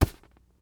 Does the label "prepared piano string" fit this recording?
No